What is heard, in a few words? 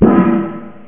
GULL